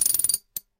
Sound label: tool